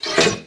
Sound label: bazooka reload; gusanos promode